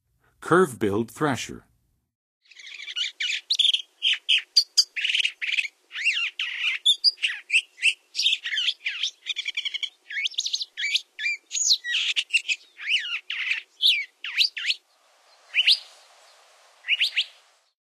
Curve-billed Thrasher, Songs of Birds; North America; Western USA; Western USA Songbirds

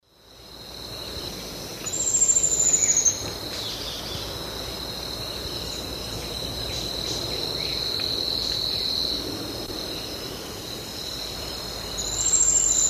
Epinecrophylla fjeldsaai, song; S. America; Eastern Lowlands

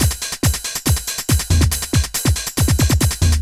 techno 140

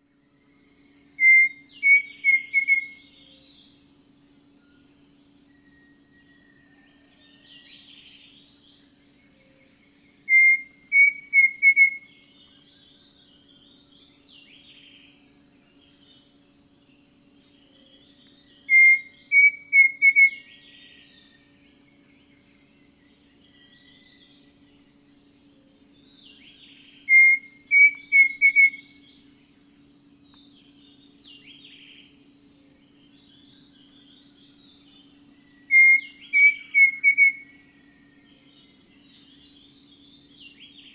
Dromococcyx pavoninus, song; S. America; Eastern Lowlands

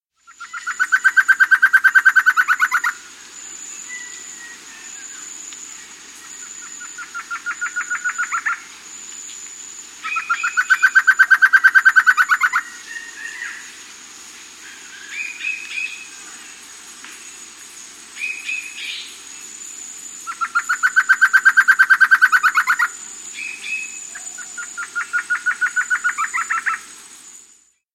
Amazonian Antshrike; S. America

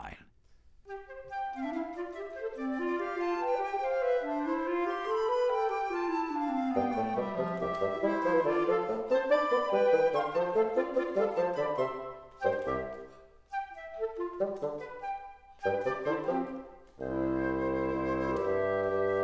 Crossed Figure 8's

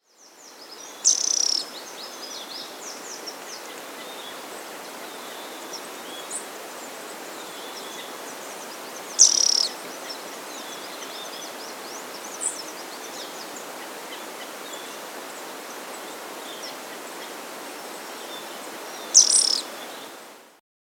Cinnamon Flycatcher (Pyrrhomyias Cin; S. America

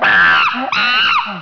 CHIMP, animal; 动物

